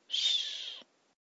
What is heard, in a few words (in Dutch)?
snurk uit